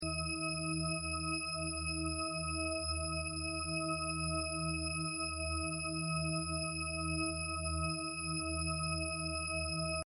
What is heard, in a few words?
pink filtered